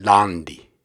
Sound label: Syllables and Imaginary Words, Viking Vocal, Hard; Viking Vocal Builder